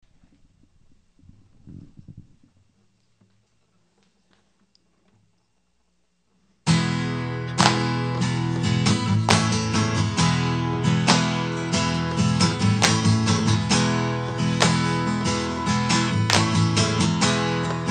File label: Slow Clap